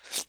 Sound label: slurping noises